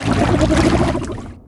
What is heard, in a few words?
waterfall